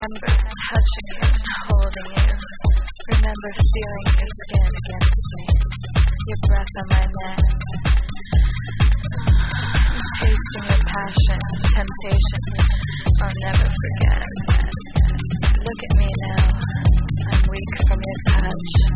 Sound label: Filtered Jazz Mix